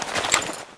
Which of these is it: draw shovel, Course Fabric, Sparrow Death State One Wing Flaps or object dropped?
draw shovel